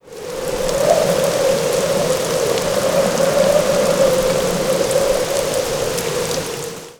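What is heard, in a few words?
Rain Moderate to Heavy with Strong Wind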